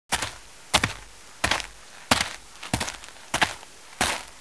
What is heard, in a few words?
footsteps; geist